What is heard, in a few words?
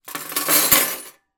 household cutlery handful down on table; zapsplat